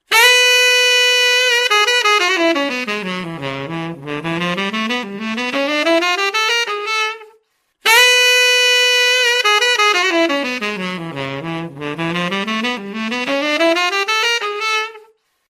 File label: saxophone ringtone; Instrumental